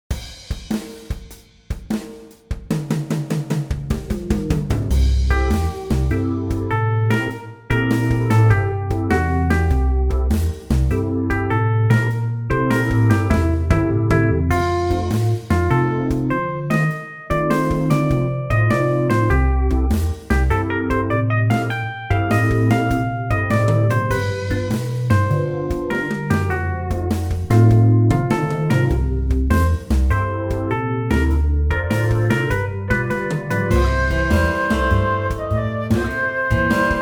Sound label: MIDI blues; spellman studio